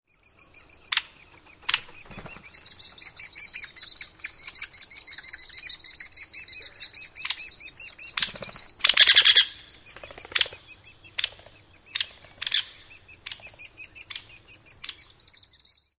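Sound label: Hairy Woodpecker, begging young and adult calls at nest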